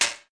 bullet hit shield; gonner linux macos ps4 switch windows xbox one gamerip 2016

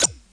Rune pickup

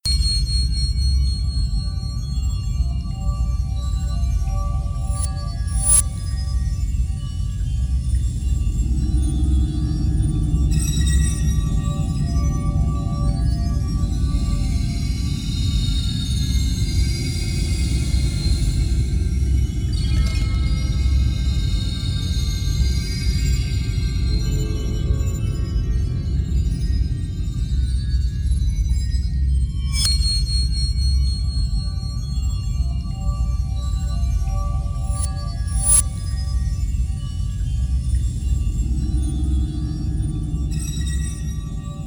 (Ambient) Ancient Puzzles; rayman 2 remastered soundtrack

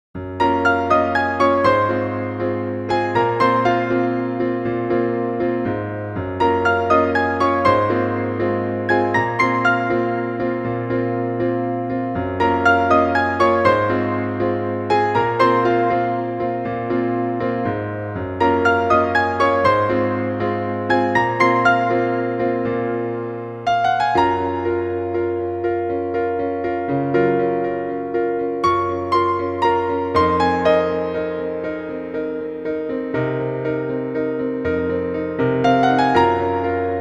piano sketch; Nightmare Project YAKATA original soundtrack 1999

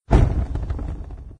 Ignite; doodle jump 2; android

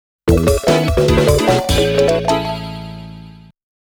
Bonus Round Goal In MUSIC; Namco Classic Collection Vol.2; arcade soundtrack

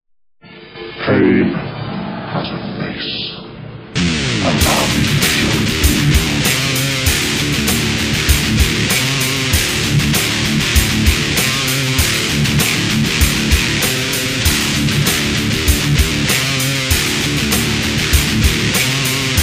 metal; D.I.R.T.; Origin of the Species

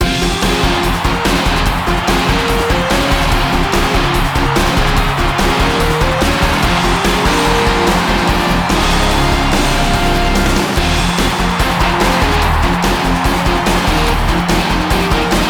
goal fulltime; EyeToy Play Sports; PlayStation 2

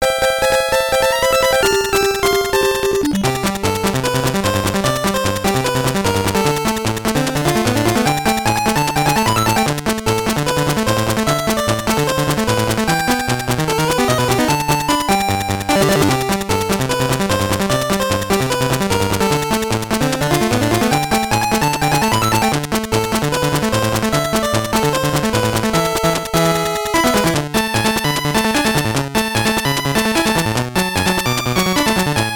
Boisterous Samba; kid dracula gb

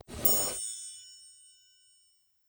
goals open

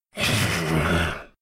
Cultist Notice Noise; Devour

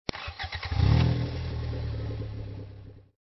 Engine Start Old

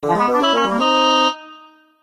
Lose; Ninja Village; android

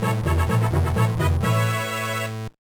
Fanfare; Yoshi Topsy-Turvy

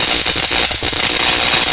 altar static zap loop; Devour; asylum

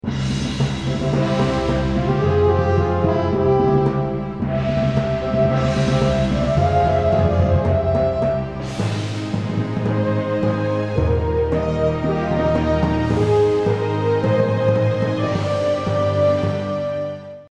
mission victory; Zeus Master of Olympus

